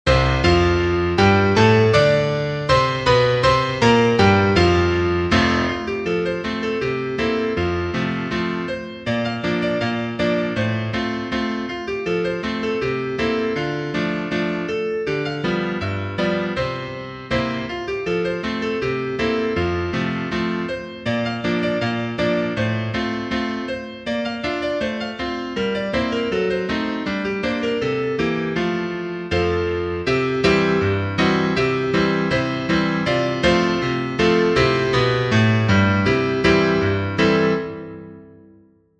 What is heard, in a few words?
piano, sheet music; Shufflin' Samuel